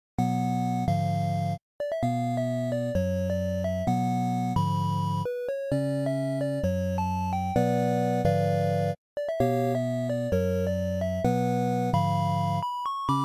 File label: piano